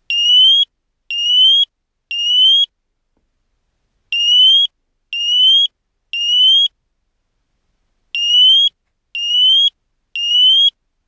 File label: Alarm-sound, rauchmelder